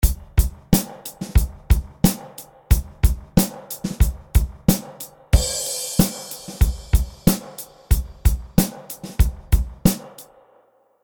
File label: Drums Spring; Découverte de… la Reverb (2)